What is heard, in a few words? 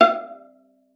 VIOLA FINGER; Xsample VOL-5